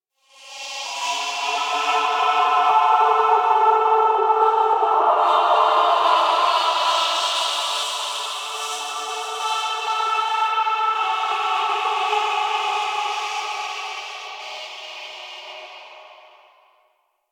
Energy Thread Music; Warframe; Apostasy

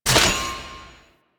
Kiosk Pillar Stop, Ship; Ambience; Props; Warframe; Corpus Ship Remaster

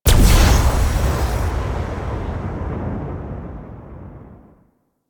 Energy Strike Start; Warframe; Ambience; Rail Jack; Venus Region

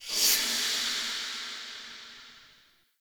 Dissapear; Orlick; Friendly

